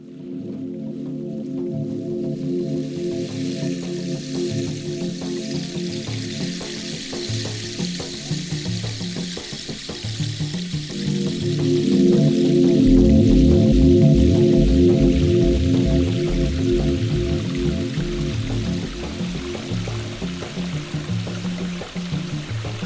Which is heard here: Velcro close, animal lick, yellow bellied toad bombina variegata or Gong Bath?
Gong Bath